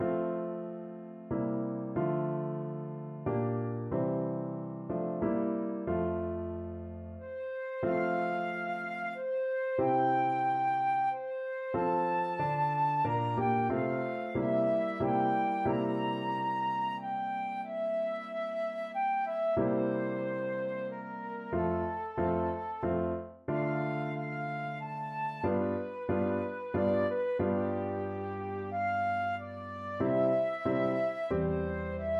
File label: isis und osiris, flute